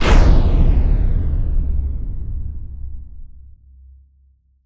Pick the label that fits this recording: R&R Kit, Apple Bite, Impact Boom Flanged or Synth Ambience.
Impact Boom Flanged